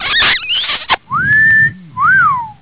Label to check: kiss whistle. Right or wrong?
Right